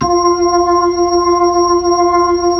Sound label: B-3 Chorus